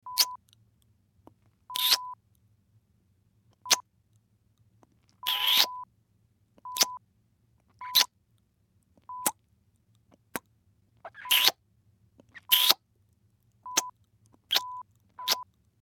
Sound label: Kisses